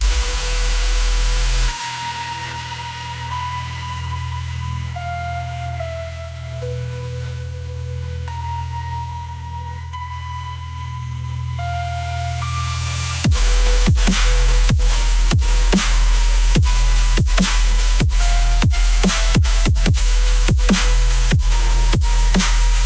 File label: bass